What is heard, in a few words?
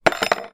industrial drill bit down on work bench; zapsplat